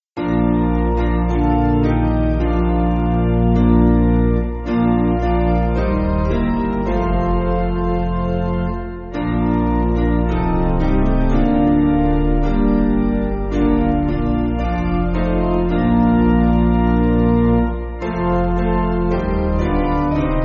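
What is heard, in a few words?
Piano, Backing Files for Churches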